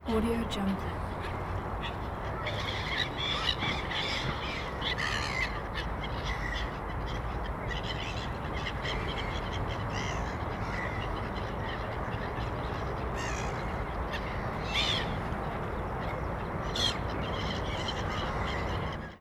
birds on secluded city riverbank; stormwaveaudio

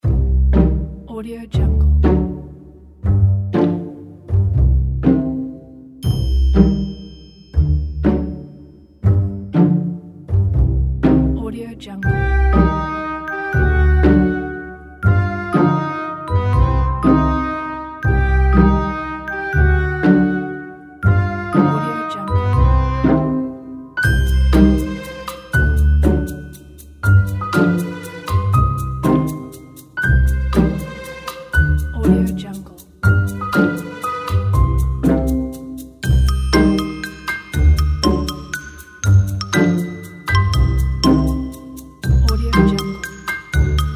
awkward comedy funny

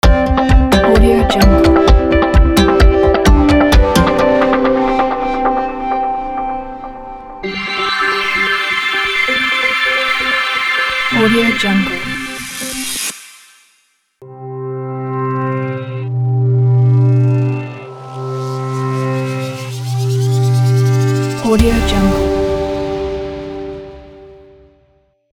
simple logo pack

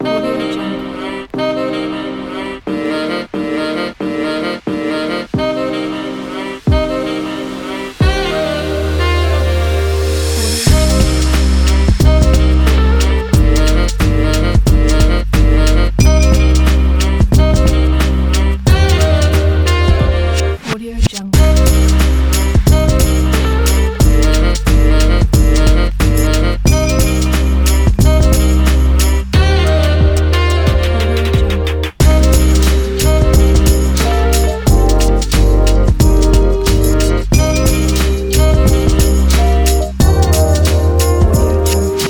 lounge hip hop

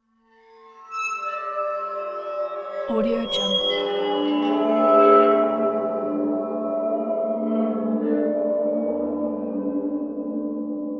eerie horror bow screech

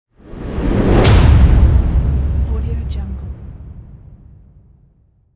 cinematic strike whoosh

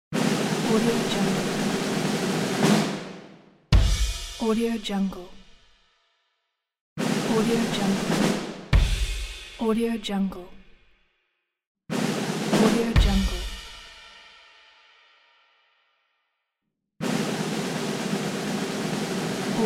circus drum roll brake

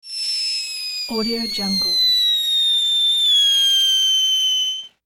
soaring fireworks through sky; hollywoodedge

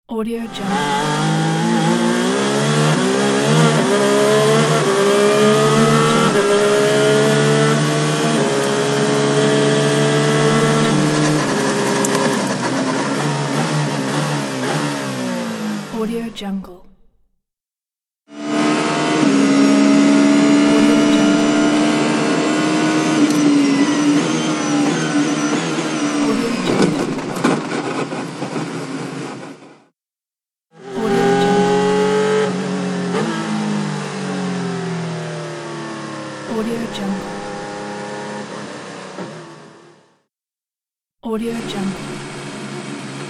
sports car sound pack

